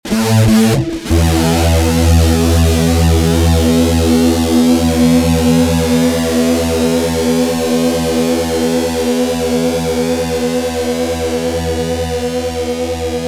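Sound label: fuzz guitar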